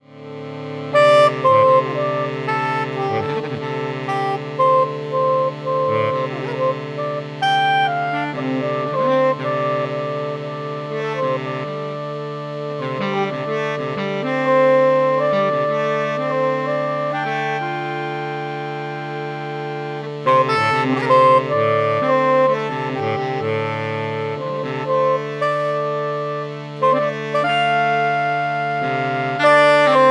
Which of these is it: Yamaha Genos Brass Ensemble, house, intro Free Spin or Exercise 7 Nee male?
Yamaha Genos Brass Ensemble